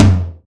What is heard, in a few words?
tom sound f key; tom drum samples